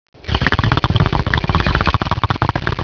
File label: waterbong